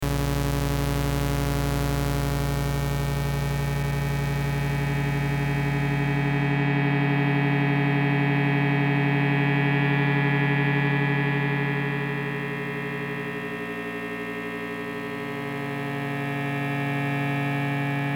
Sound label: saw deform